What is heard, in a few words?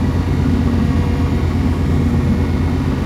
Engine Sphere Loop; Ambience; Warframe; Grineer Galleon